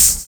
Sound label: SUB HH; Univers Sons - Kitch 70s